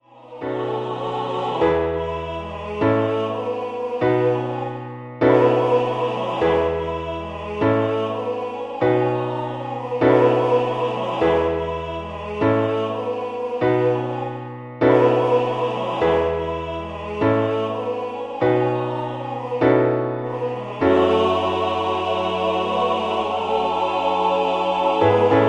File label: MIDI SATB